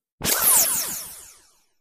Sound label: Space Laser